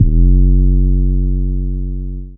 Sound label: trap bass; multisampled; 7rystan Trap Bass